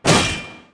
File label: pickaxe